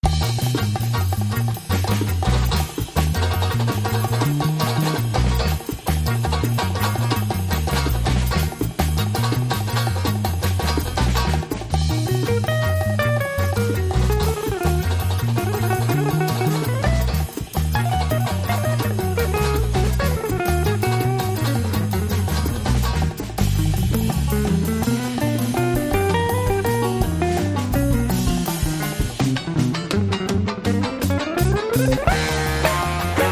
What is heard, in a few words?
Jazzin and Surfin, Light Guitar Solo